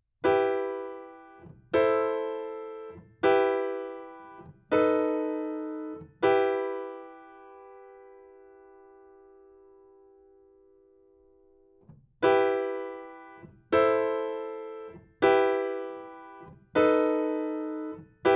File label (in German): Harmonische Kadenzen in e-Moll Übung